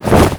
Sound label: wingflaps light; bri bri bicus